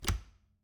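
Refrigerator, old fashioned, wooden, steel, brass clasps, hinges, open; Eiravaein Works; Vaeyan IV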